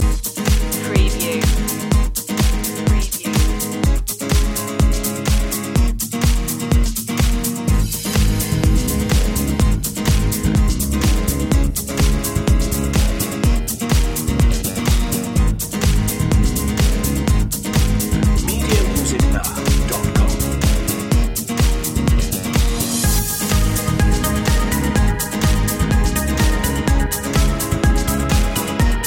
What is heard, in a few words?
latin nights loop long; media music now